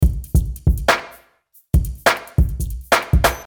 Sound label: Drum Wood Room